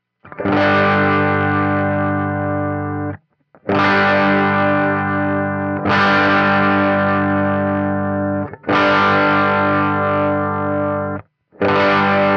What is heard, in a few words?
scratchy